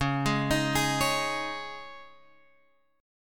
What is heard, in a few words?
C sharp Suspended 2nd, C sharp, Guitar, Open F; C#sus2; arpeggiated; JGuitar